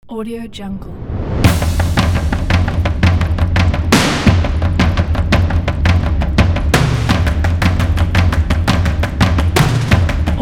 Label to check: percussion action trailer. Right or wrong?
Right